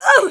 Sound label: death; mohaa; dialogue